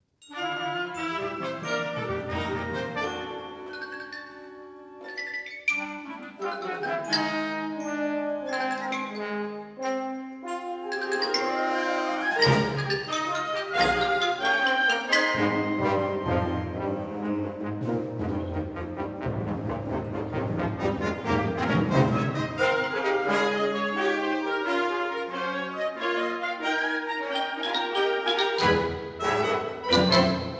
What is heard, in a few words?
after a gentle rain ii wind band